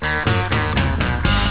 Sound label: wolfman; beeps